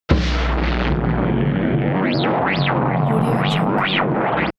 science fiction space earth radiofrequency connection